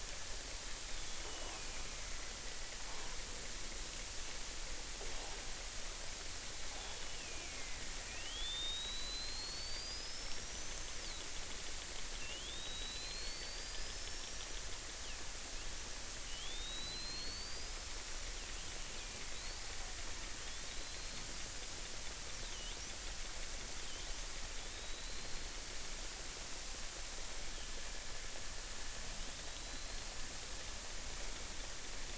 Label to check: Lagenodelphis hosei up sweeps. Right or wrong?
Right